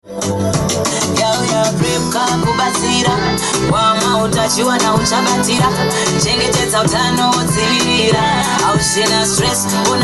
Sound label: jingle